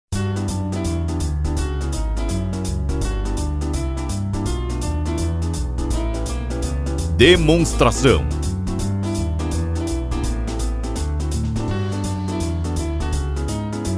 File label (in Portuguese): Trilha